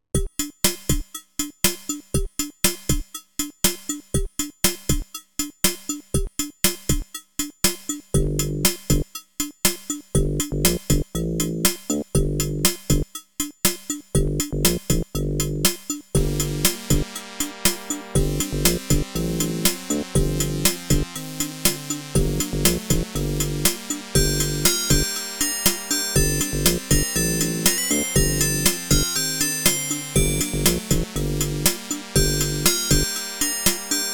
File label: ADLIB OPL2